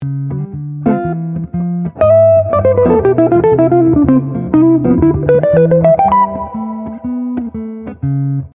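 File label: Lick, Jazz Fusion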